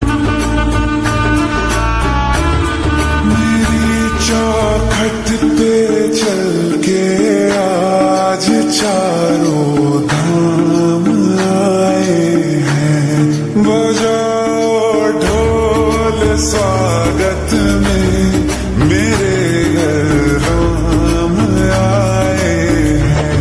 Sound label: Lofi Slowed Reverb Ringtone